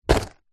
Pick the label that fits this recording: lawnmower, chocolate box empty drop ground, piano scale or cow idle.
chocolate box empty drop ground